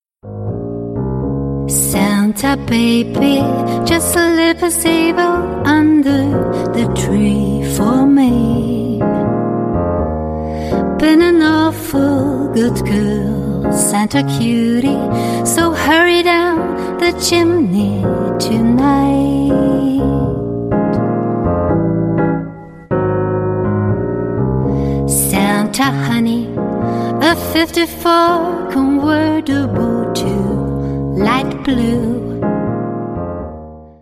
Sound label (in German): englisch jingle